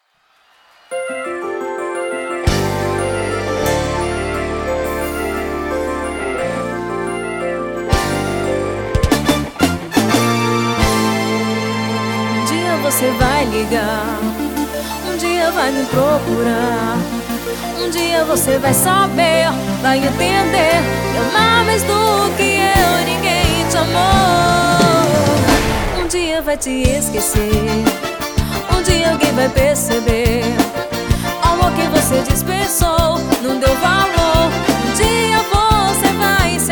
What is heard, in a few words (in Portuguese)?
Forro Swing Caliente